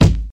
short bass drum single hit g sharp key, kick drum tuned to the G# note